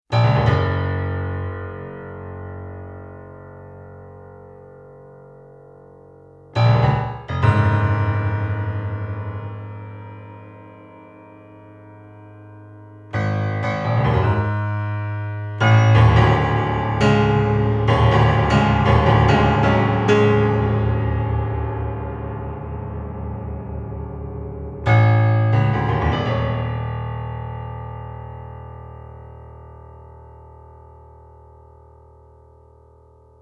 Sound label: piano prog impromptu